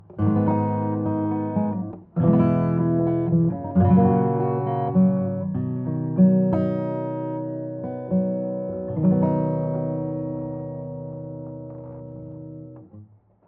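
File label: Valves FILTER LowPass 2 pole CUTOFF 770Hz RESONANCE 60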